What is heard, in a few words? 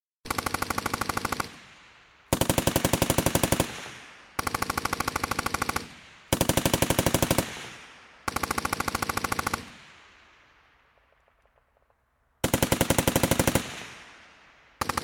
General purpose machine gun firing from in front & close left; Guns Gunfire; BBC